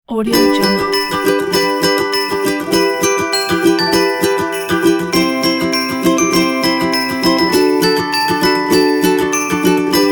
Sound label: christmas jingles pack; crazytunes